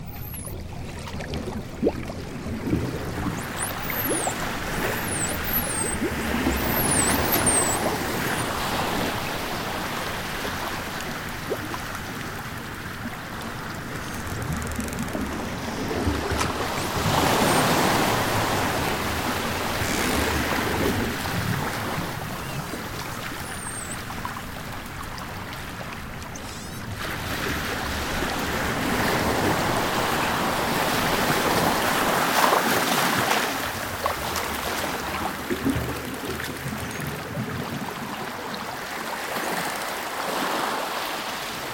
Shoreline - Waves on the Shoreline; OCEAN; Sounds of the Earth; Sleep Sounds of Nature

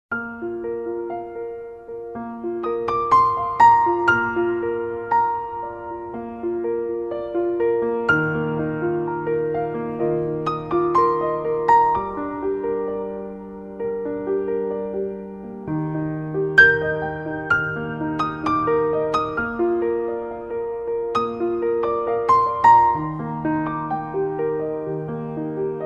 Piano

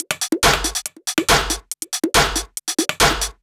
No Kik Loop, Dance, Beats

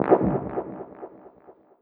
Vortex; Dance; SFX

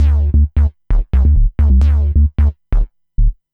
bass, dance; Basses

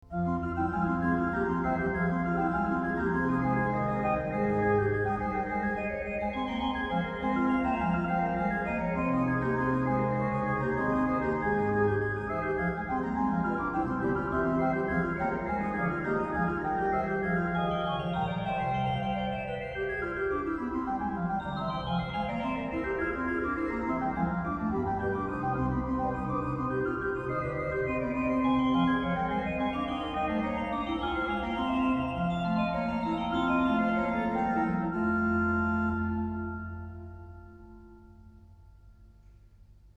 partita iv, Great Organ Works; Bach; Great Organ Works 3

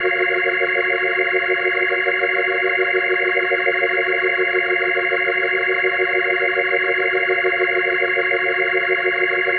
Organ F# Maj7, Organ Licks

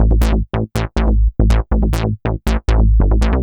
bassline, dance; Basses

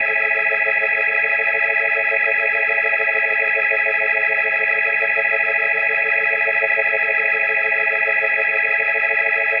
Daddy-O Organ A Maj7+8va, Organ Licks

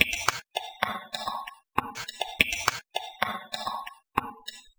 Snap Groove, Concrete Beats, Electronica

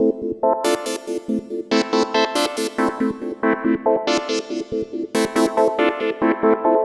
Synth, Synths Lead; Dance

